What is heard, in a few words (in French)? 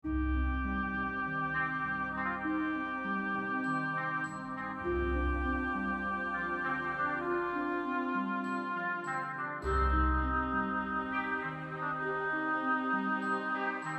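Tristesse, les émotions; Tristesse 3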